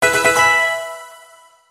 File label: Level Up; ORAS; infinitefusion